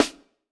Snr, drum

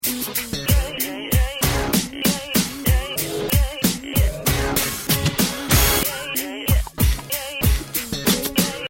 loop mono

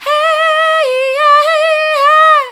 hey yer soft, vocal

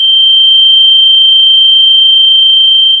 Sound of a tea kettle whistling

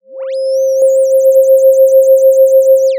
Sound of a police car siren

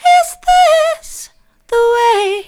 soft, vocal

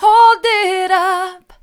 hold it up mid, vocal

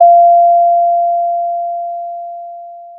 pop, Sound of a doorbell

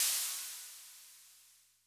Crash, drum

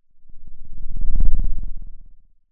Sound of a lion’s roar

